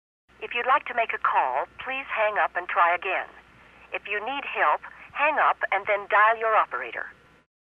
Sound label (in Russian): phone